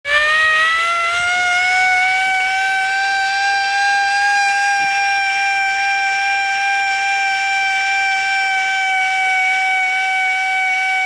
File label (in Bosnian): SIRENA DAN ŽALOSTI